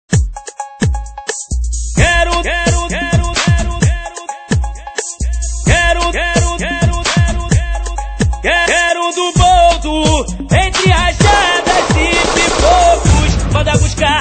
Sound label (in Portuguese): funk batidas